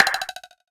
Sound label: lightbulb out; HeavenStudioPlus; karateman